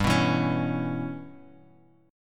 G 6th Flat 5th, Bass Standard, G6b5 chord; G6b5 strummed; JGuitar

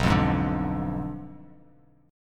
B Diminished 7th B down; Bdim7 strummed

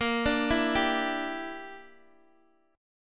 A sharp 6th Flat 5th, Guitar DADGAD

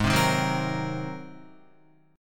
G sharp 9th G sharp Guitar; G#9 strummed; JGuitar

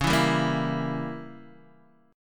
Db Diminished 7th Db down Guitar Open D

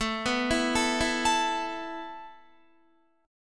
A Suspended 2nd A Guitar; Asus2; arpeggiated; 17,14,14,14,16,16

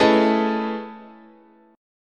A Major Sharp 11th, chord, guitar

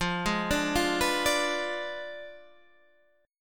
F Diminished 7th F down Guitar Standard

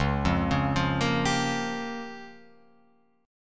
Db 6th Flat 5th Db down Guitar Open F; Db6b5 arpeggiated; JGuitar

